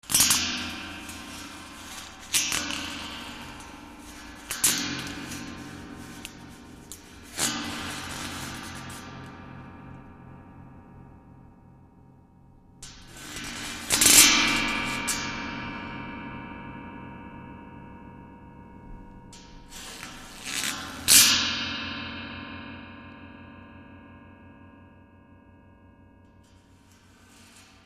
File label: Gong Scraped W Razor B